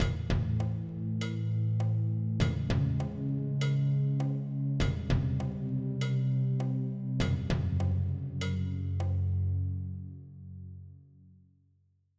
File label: tick tock; aargon deluxe